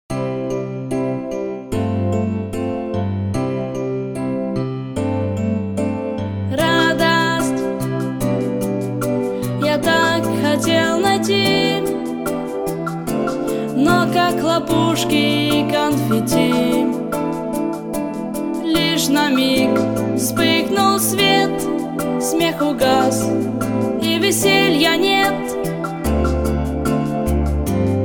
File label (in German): Chor; Freude; Tropinka Chor 2009